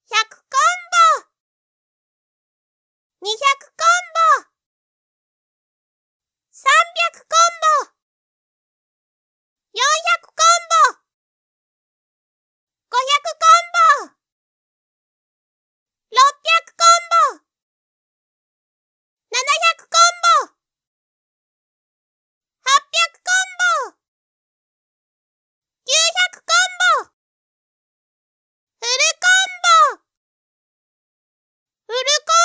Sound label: taiko